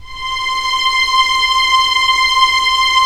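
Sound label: STR SC, strings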